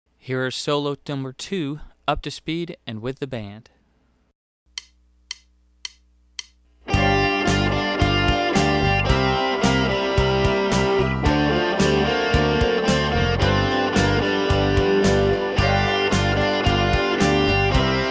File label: Solo Fast